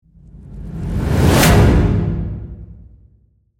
Whoosh To Hit, Reverse, Warble, Musical, Impact, Accent, Transition; Production, Element, Imaging; Whoosh Sound Effects; BlastWave